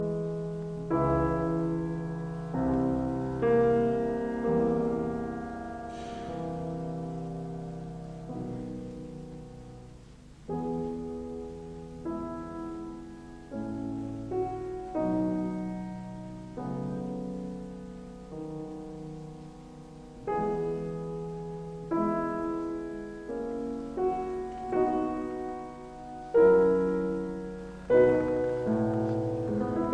Piano Sonata in E major, Andante molto cantabile ed espressivo; Piano Sonata No 30; Op 109